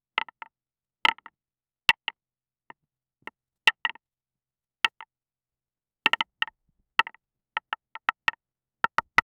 wooden clatter of cricket

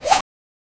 a short sound when slide, system

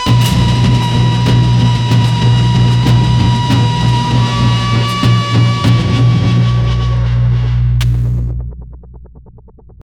electronic guitar adrenal, motivational

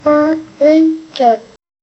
the katana is drawn from

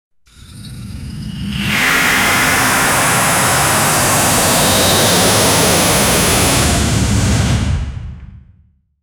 pure nitro activation, racing

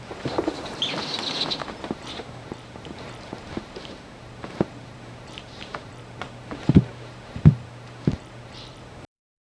soft fabric rustling; wooden creak

